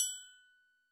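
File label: TRIANGLE LONG, Yamaha SY85 Drums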